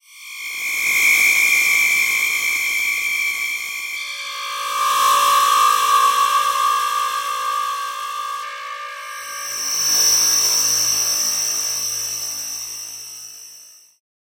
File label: atmo gloomy ambient